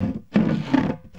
LITE BOX, Box Noises; E-MU Producer Series Vol. 3; Hollywood Sound Effects

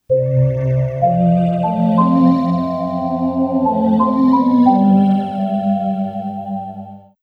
Ambient Keys; Sonic Foundry (Sony Creative Software); Slow Silhouette

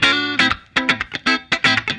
Funky Guitar; Sonic Foundry (Sony Creative Software); What it Is! 70s Analog Funk; The Funky Mama

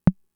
Tom, Fricke MFB-522

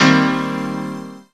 CHORDS; EastWest; Dance Mega Hip Hop Electro

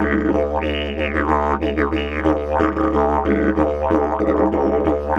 Didge 93 BPM, Didgeridoo

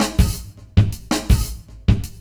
LOOP, 70's Breakbeats; USB Soundscan vol.46; AKAI

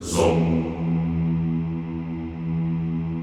ZOOMMM E1, Classical Choir; Best Service; Extended Classical Choir

